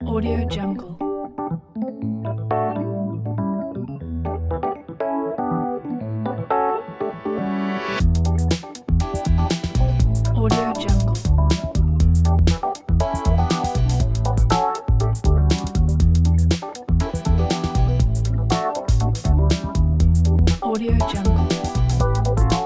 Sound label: energetic funky kit; blackbirdsound